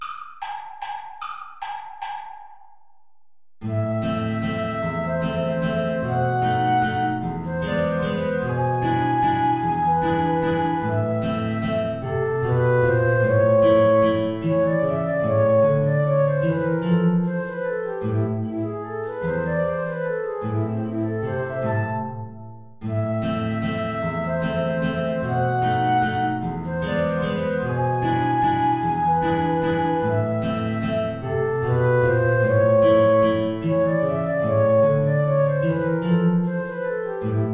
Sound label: play along without clarinet; traditional